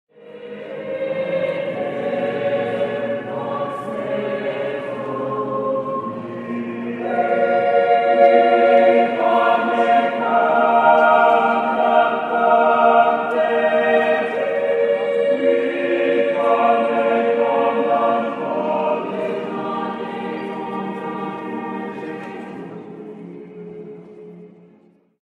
Choir Acapella Christian Ringtone; ringtones

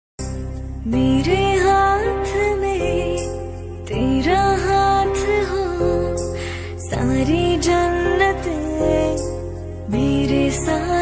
Female Version Ringtone

